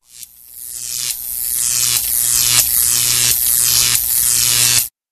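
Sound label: Electro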